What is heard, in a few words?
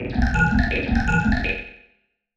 bubbles, bass